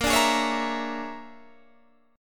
A sharp 7th Sharp 9th Flat 5th A sharp down Guitar Standard; A#7#9b5 strummed; JGuitar